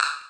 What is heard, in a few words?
Wood, Block Stick; Equipped Music; Loungin House